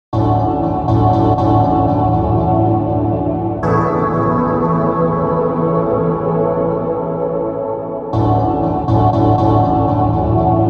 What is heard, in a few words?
Sine; gekiban